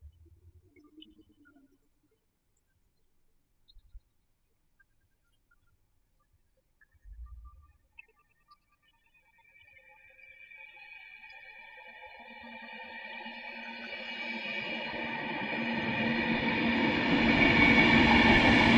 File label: Train Bird